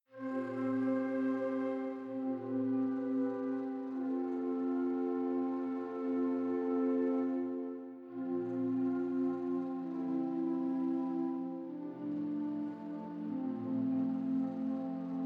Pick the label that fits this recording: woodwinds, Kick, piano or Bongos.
woodwinds